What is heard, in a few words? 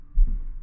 footstep upstairs; Zomboid 41.78.16; Project Zomboid